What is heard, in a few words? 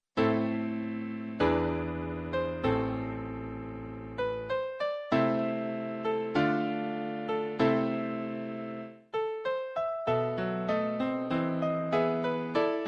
arpeggione